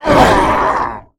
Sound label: Death